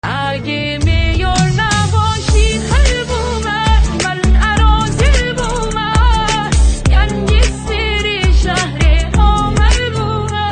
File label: AI Voice